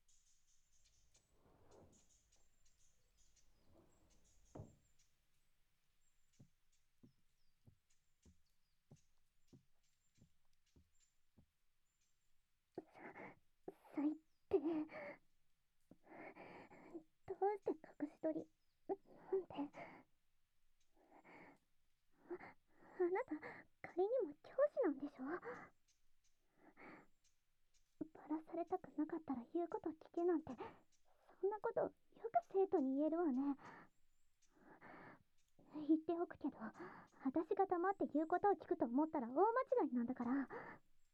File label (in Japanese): ベロチュー、オナホ手コキ; 寝取られ視点; 脅迫